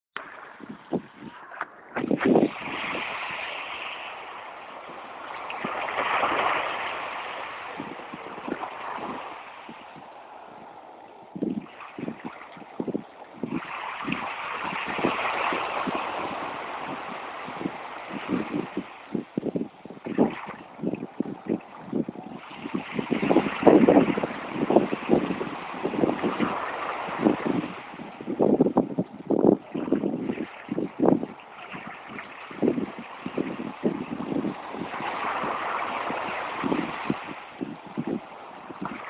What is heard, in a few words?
Sounds of the ocean